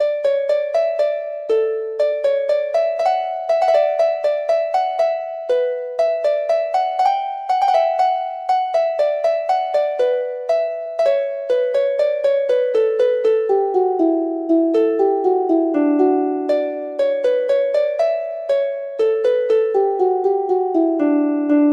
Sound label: harp